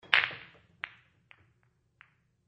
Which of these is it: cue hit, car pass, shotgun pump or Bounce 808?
cue hit